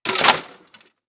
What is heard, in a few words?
Impact Debris Plastic Debris Drop; Impacts